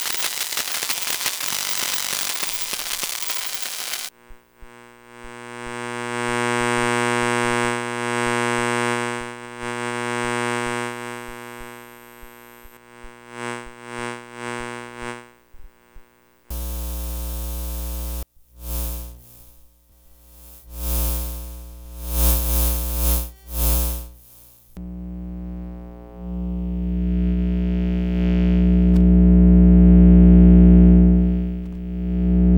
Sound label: Pickup Coil Sampler